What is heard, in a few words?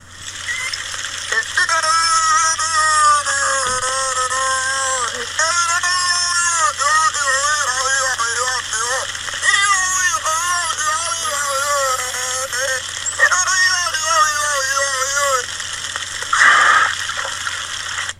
Baluga whale